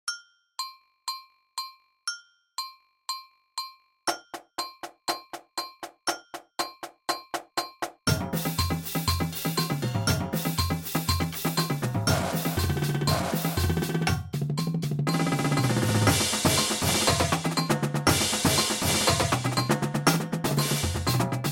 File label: 120bpm, drumline